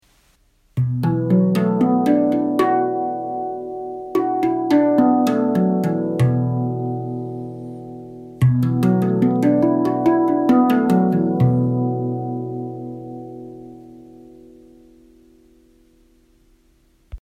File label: handpan spacedrum; japan sunpow